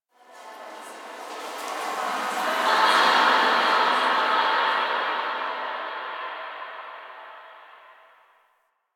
Memory Echoes

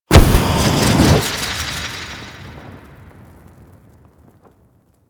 Teleport; Stalker Assassin; Warframe; Warframes

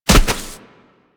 Bird Of Prey Bodyfall Grass; Warframe; Hunting Critters

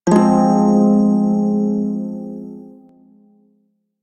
Prime Shawzin Phrygian Chord G; Music; Warframe

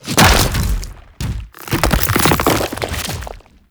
Man In The Wall Biped Entry, Enemies; Warframe

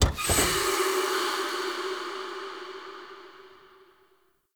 Chest Open; Objects; Chests

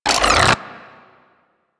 Primary Reload, Weapons; Sapient

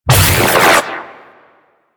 Pilot Radial Aura; Warframe; Amalgam Carrus; Abilities

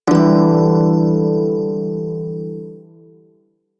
Prime Shawzin Maj Chord E; Music; Warframe

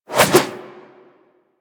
backflip whoosh; Warframe; Generic Foley

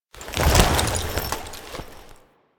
Horse Gallop Start Whip; Foley Actions; Warframe; Vehicles; Duviri

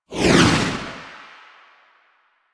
Motorcycle Passby Whoosh Medium; Motorcycle Ambience; Vehicles

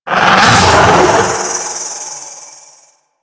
Vocal Attack; Warframe; The New War; Archon; Nira

